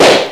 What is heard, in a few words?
luger; WAR